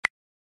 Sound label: Button Hover